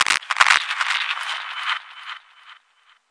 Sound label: insect noise break; Various Strange Sounds and Effects